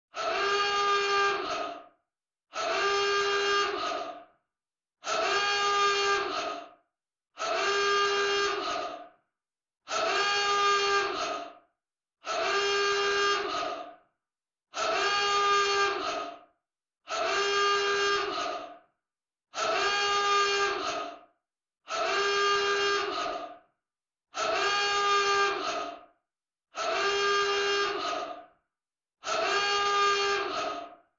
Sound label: Signal, alarm on a submarine